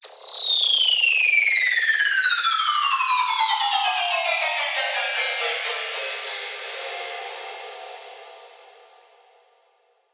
Downlifter

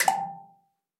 Pika Correct

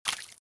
Tear impacts; The Binding of Isaac

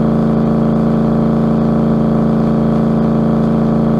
Engine Muscle Rpm Med; kart racing; Boom Karts; Android

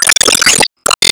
Enemy Road mechanic Active Shot

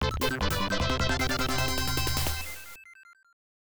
Stage Clear; Bomber Through; GoGo Jump Hero Gaiden 2; IBM PC/AT

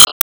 Switch On

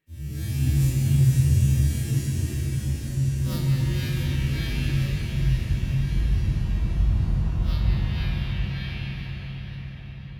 Boss Roar; Avie

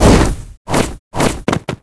Whirlwind; Whirlwind ToyAlive 01

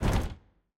Door buruburu

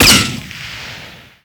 Shoot; Bouncer

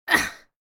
Hurt Grunt; Isaac

